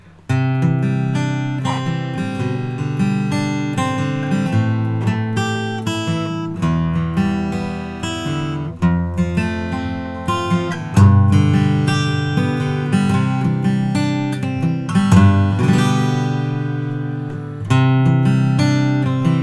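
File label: mission grand concert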